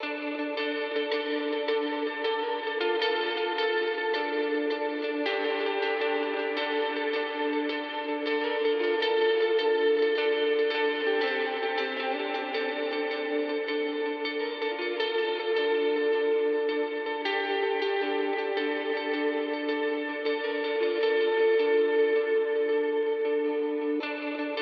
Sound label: Guitar; raji an ancient epic